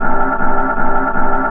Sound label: D+B 160; eJay